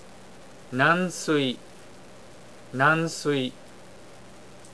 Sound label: soft water; japanese